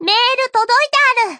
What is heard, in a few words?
new msg